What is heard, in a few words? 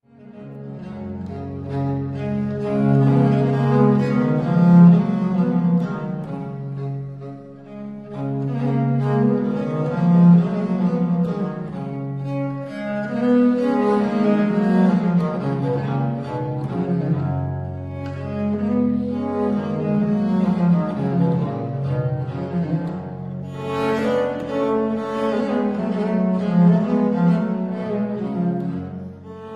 for 2 instruments in G major, Chaconne